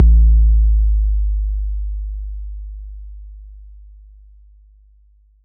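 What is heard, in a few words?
bass drum 808 Tube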